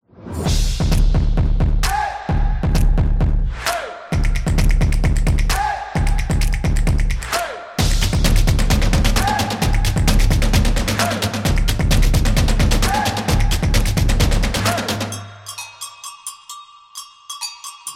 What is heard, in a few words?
This Is Percussion Time